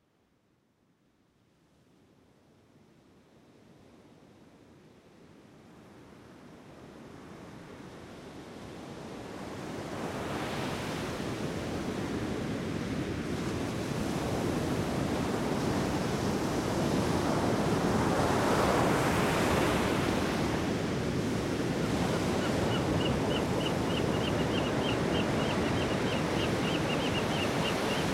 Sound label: Pacific Surf & Songbirds